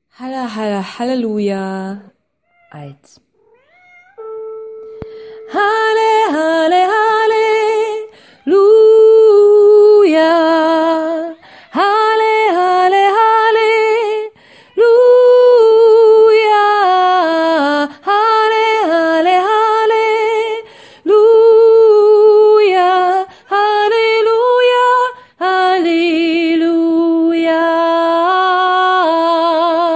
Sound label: Halle halle hallelujah alt